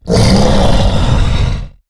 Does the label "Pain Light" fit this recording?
Yes